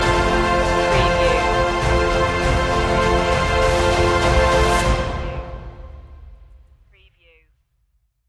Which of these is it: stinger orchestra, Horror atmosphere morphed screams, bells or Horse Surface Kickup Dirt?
stinger orchestra